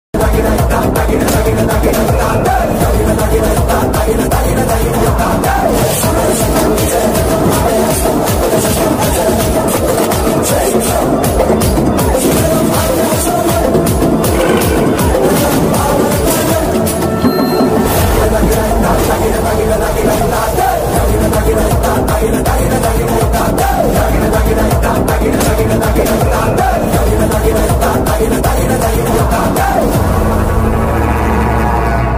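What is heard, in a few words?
trailer background music ringtone; skanda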